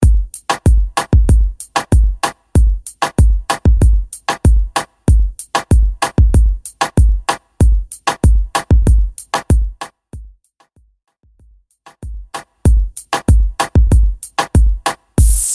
Top40 Pop Re Drum

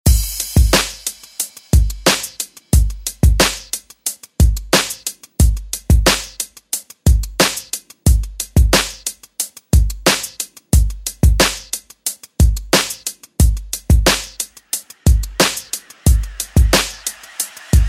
90s Redrum; DJ Mhark

